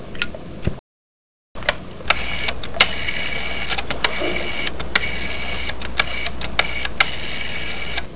PHONE, 电话; 设备音效; 办公设备; 特酷声音素材库

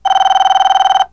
PHONE, 电话; 特酷声音素材库

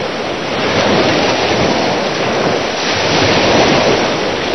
sea; 暴力赛车

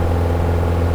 Normal Idle, 暴力赛车

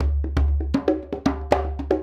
ESSENTIAL PERCUSSION; closetobill8pack

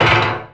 Game, 游戏音效; 特酷声音素材库

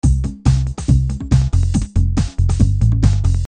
LOOP

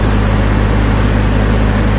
Driving; 暴力赛车

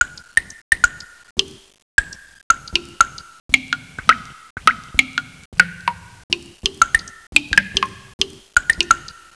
Water, 自然, 水声; 特酷声音素材库

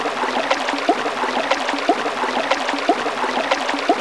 Water, 自然, 水声; 特酷声音素材库

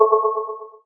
Game, 游戏音效; 特酷声音素材库

